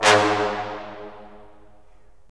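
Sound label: brass; Roland - LA Composer vol. 3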